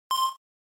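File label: Game Boy General Pitch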